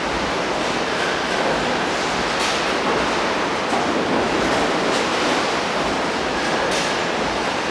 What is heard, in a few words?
industry; Mafie 1